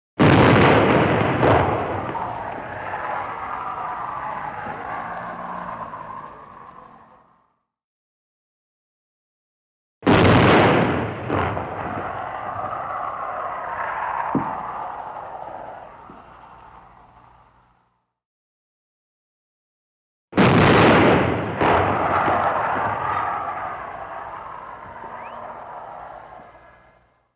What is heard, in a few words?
Canon Shot Shell Whir, modern artillery exterior